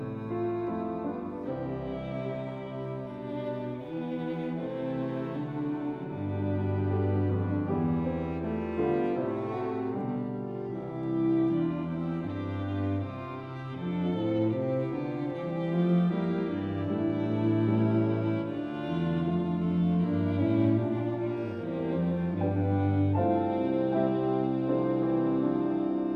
Weihnachtsmusik for 2 Violins Cello Harmonium and Piano